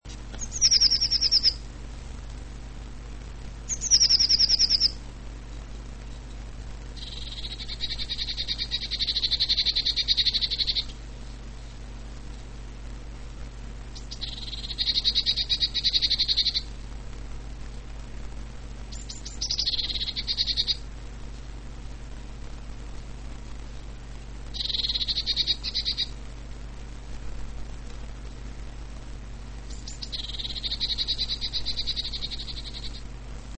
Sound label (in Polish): modra; wroblowe; sikorowate